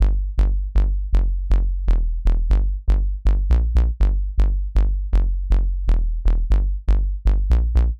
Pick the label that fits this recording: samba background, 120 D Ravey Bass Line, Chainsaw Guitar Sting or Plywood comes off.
120 D Ravey Bass Line